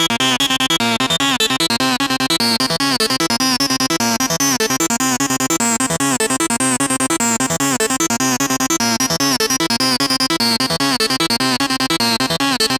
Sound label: Eradicator Lead; acid techno trance vol2